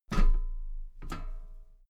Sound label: step metal ladder step top soft